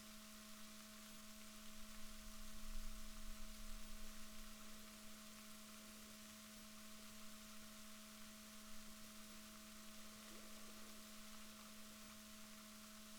rorquals positif, Balaenoptera Physalus Chile

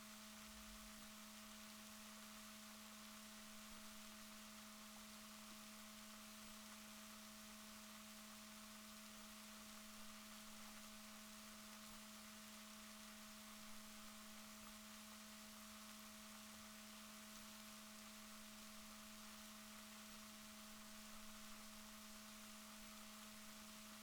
rorquals positif, Balaenoptera Physalus Chile

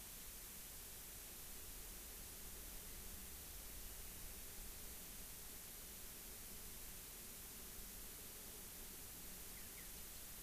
rayol farm; SEAMED

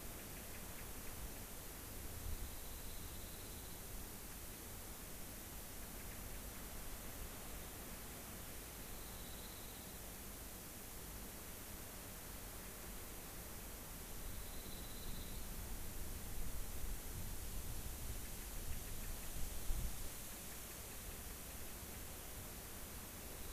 rayol farm; SEAMED

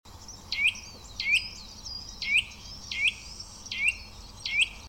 Sitta europaea; SETE

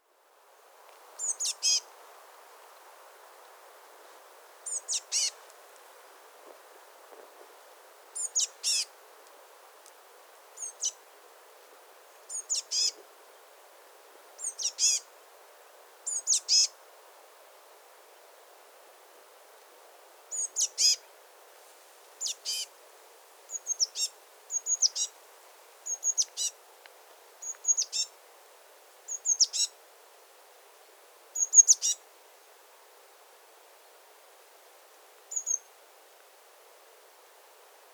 Cyanistes caeruleus; SETE

